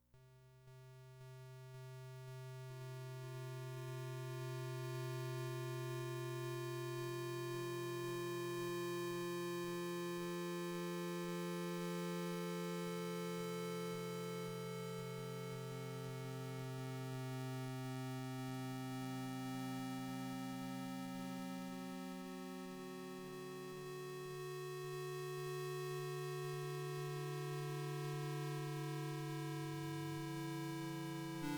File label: an experiment in 4 bit ambience; return to salyut 7; weeklybeats 2012